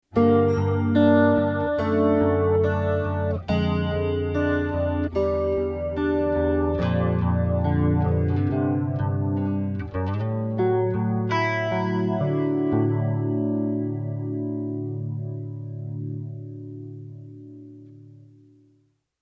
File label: Tri Warm, tonecore; SC Tri Warm